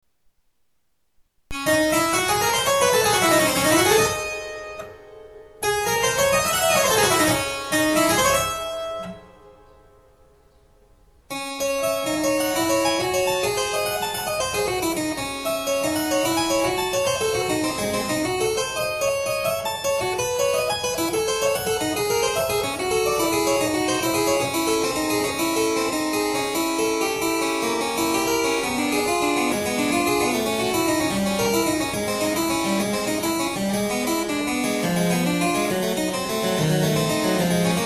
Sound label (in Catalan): fantasia cromatica re menor; bach